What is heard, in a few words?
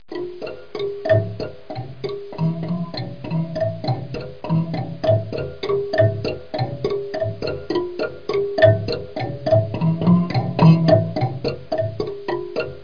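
marimba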